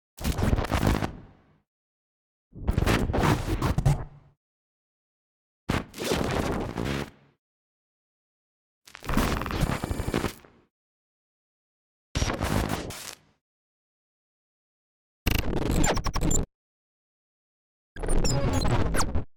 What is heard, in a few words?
Glitch Sound Effects; ambience